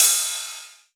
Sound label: Rides, Cymbals